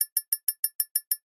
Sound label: multiple click